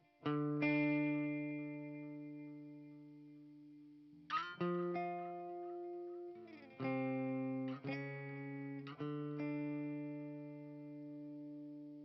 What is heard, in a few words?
PRS Mesa MK 2 Clean, Guitar